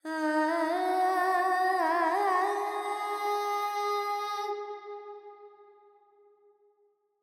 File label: Verb Charukesi, Carnatic Female Alaps